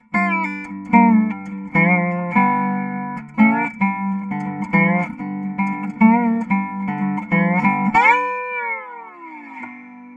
dobro through guitar invfz

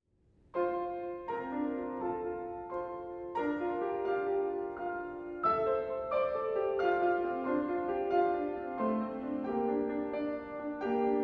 Men's Choir; MN All-State Men's Choir 98

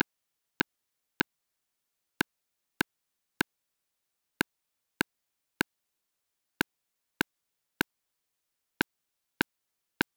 Clarinet vs Ideal impulse

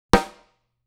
snare

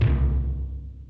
timpani

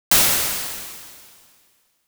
noise change ir

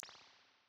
impulse spring

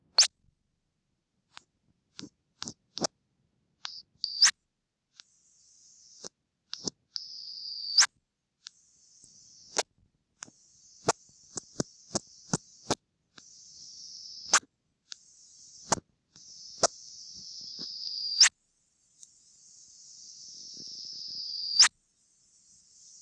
circuit bending

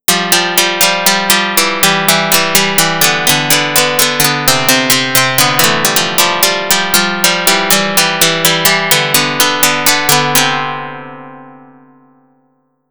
karplus chopstick

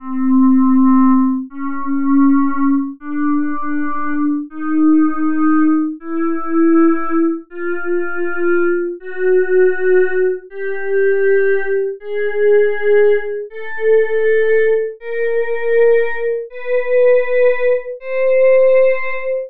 eqt vs pythag chromo sc

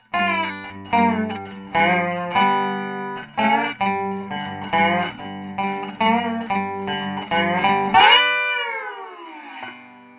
dobro mic minus piezo

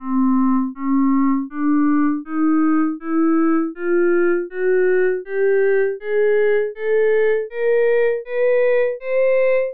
just chromo sc

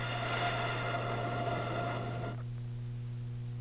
plastic Flute

